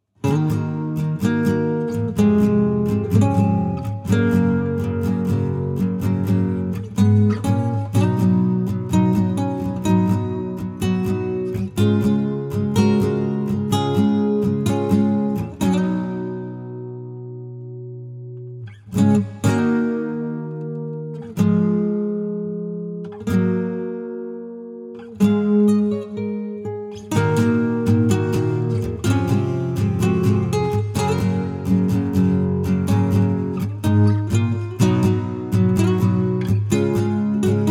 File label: Acoustic; Ryoshima Plains